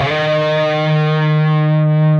PRS E, Guitars, LEAD; Spectrasonics; Hans Zimmer Guitars VOL-1

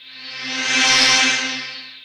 Back Effect Sect, Effected Horn Section, Jazz; Sonic Foundry (Sony Creative Software); Jazz Solos and Sections

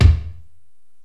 STEREO DRUMS

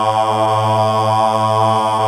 GOSP. AAAHH; Sound & Vision - Gigapack II CD 1

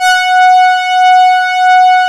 SYNTHETIC, Strings

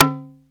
bata; AKAI S-Series CD-ROM Sound Library VOL-5

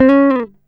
GTR TRIK, Jazzistic; Univers Sons

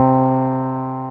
C Synth; M8; Electronisounds; Enigma Dub Cube One Shots

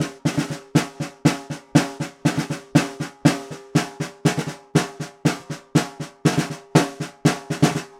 Vintage Ludwig Snare, Percussion

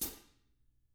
foot, Drums; TAMAKIT

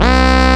SYN, Analog; Sound & Vision; Gigapack I CD 2

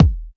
BD, Bassdrums, Roland TD-20

